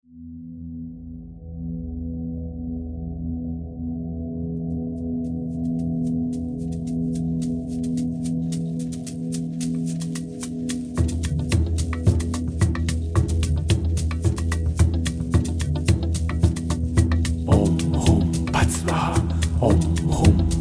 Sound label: OM HUM PHAT SVAHA; mantras sanacion